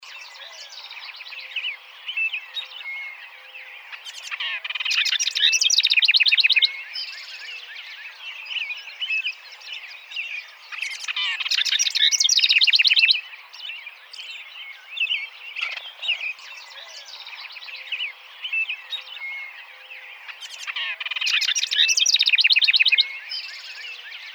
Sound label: House Wren